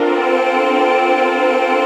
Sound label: VOICE PAD, ATMO PADS; Best Service; XX Large